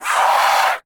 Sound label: Banshee Damage VO, Monster Roars; Phase 4 Libraries